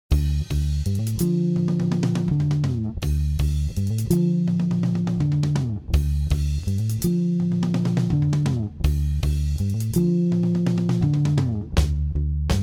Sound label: Classic Rock